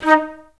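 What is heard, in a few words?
flute staccato D#4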